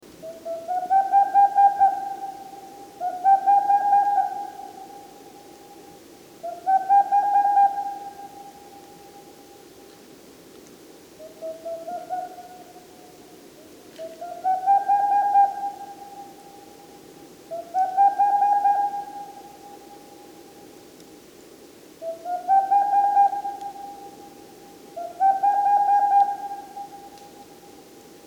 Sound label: Tengmalm's Owl Aegolius funereus, song, Dzhukey, Kazakhstan